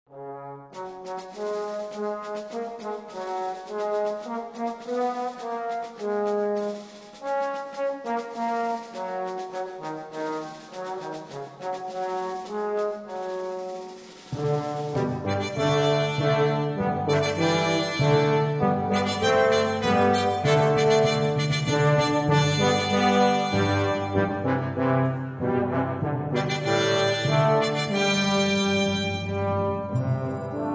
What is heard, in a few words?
Brass Choir